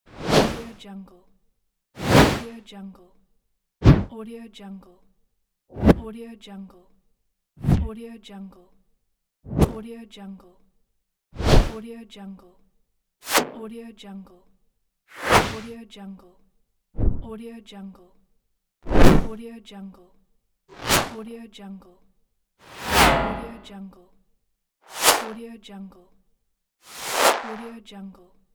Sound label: whoosh; musicante